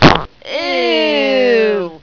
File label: eww